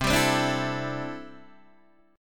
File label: C 6th Add 9th C down Guitar DADGAD